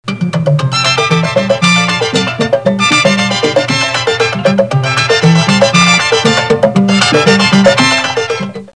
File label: chacha